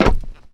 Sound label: metal solid, footsteps